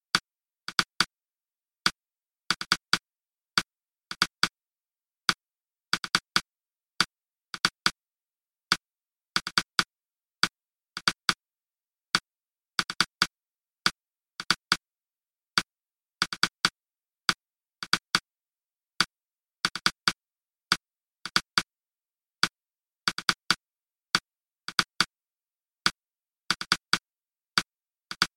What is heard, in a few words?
Clock